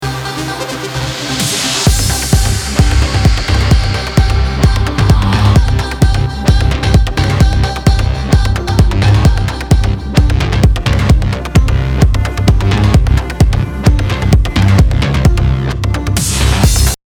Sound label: EDM Mix